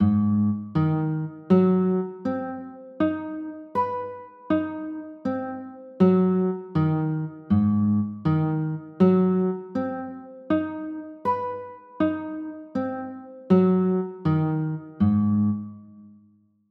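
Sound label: G sharp 7 sharp 9 chord, guitar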